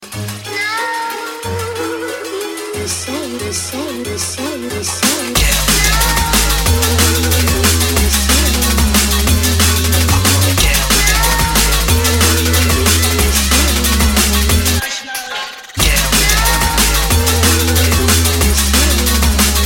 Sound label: Drum N Bass